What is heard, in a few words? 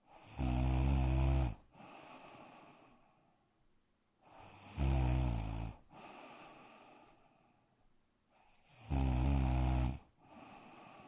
Snoring In Bedroom, Human, Snoring In Bedroom, Female Snoring